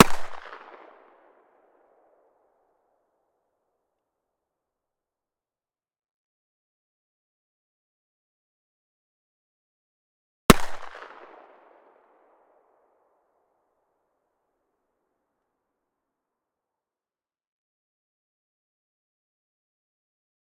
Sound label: Colt Python 357 Magnum - FIRING - 4m Left; Pole Position